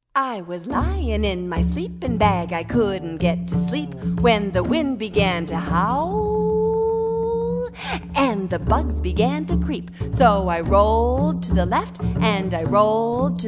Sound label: Sleeping Bag Chant